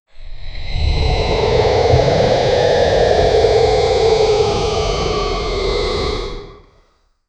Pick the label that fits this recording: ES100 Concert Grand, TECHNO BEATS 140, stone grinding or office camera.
stone grinding